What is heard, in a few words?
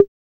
CR-78